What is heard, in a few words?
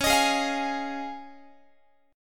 C sharp Major Add 9th, Guitar; C#add9; strummed